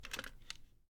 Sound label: Cassette Put In; this is the police pc